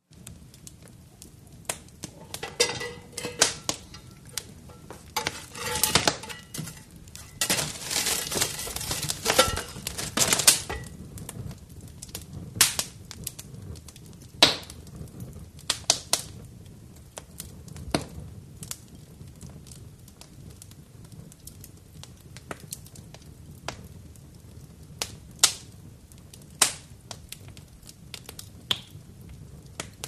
FIRE FIREPLACE: EXT: Poking; fireplace exterior; Poking fire with poker, reverberant fire crackle & snap

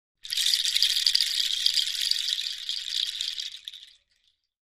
Shaker Bracelet Natu, percussion shaker

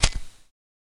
Zippo Lighter Flint; lighters & matches

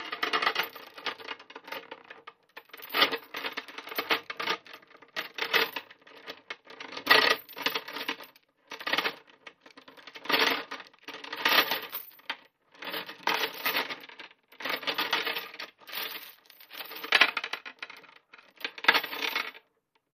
Chain Being Placed On, chains; Chain Being Placed On A Can

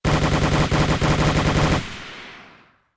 Automatic Weapon

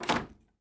Attic Closet Door Clo

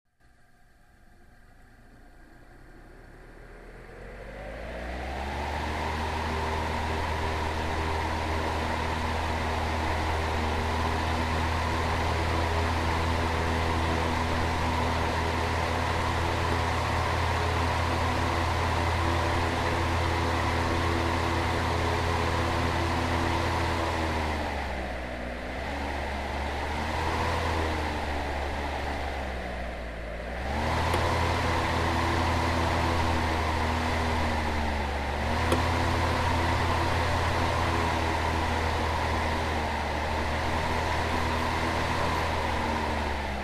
Fan Blower Slow Up; fans

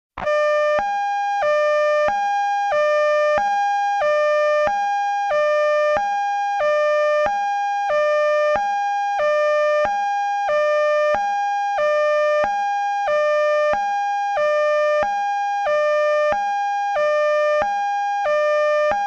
Sirens High Low

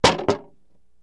Mallet Hits Wood Shield

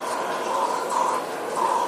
Medical Pump Looped, liquid & suction

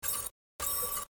Fast Whir Access Disk, computers

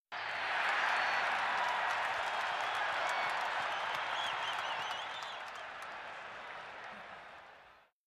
Football Crowd Chee, sports arena football

